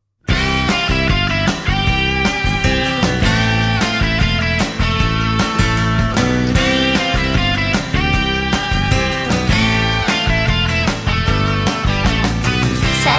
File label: ring tone